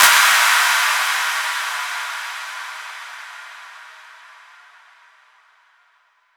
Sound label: Fx Impact; Fx Impact Ghoul